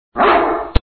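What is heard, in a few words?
dog bark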